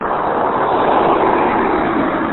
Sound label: noise cars; kunstradio